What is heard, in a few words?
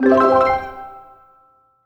happy collect item